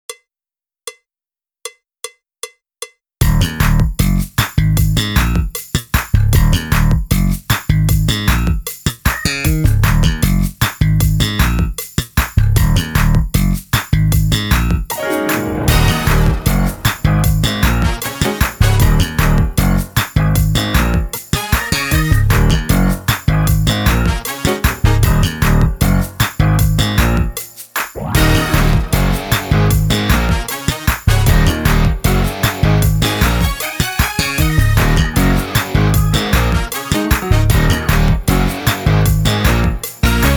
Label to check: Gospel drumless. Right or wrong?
Right